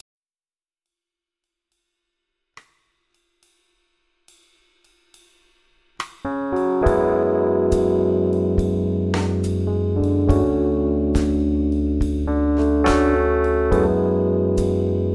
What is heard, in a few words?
suitcase tremelo; MrRay73mkii